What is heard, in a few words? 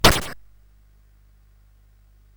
Paddle Mania, CSG8580R5